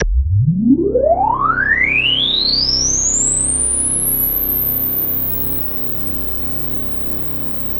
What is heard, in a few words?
C, KORG DW8000, Vintage Keyboards; Big Fish Audio - First Call Vintage Keyboards